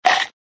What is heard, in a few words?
bite; cartoon; hannah barbera cartoon sound fx